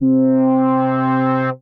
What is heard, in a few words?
Chord Creator, Synth Pad; Neo Chord Creator; Ableton; Creative Extensions; Poli